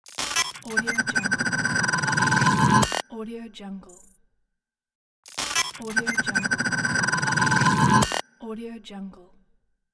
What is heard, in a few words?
glitch logo